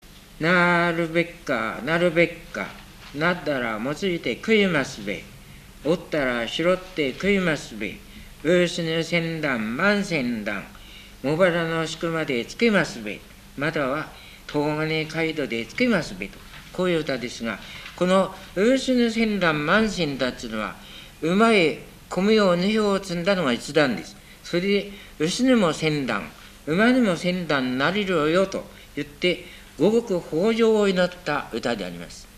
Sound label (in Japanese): warabeuta